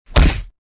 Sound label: punch, melee; weapons